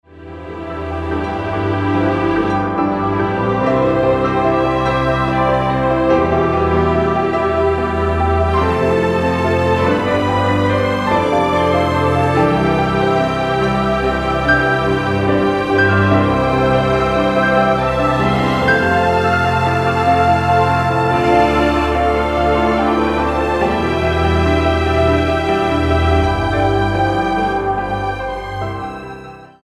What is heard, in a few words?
I Will Sing a Joyful Song, INSTRUMENTAL